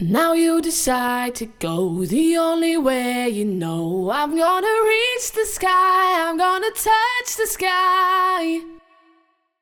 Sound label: VOCAL